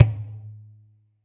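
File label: TOM loud; Zero-G; Chemical Beats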